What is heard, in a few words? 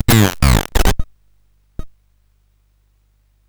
Aurum, CSG8580R5; sid